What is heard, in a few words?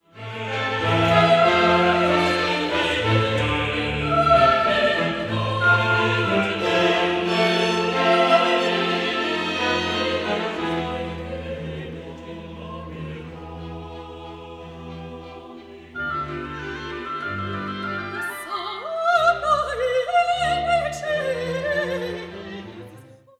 Benedictus Woodwinds